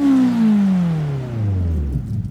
slowing, vehicles cars; mazda787b; lwcars

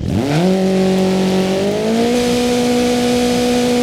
first revlimit, vehicles cars; renault alpine; lwcars

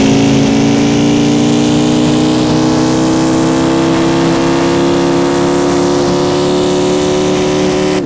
third, vehicles cars; nascar; lwcars

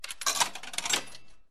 coin

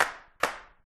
emote metronom sfx; actmod; fortnite

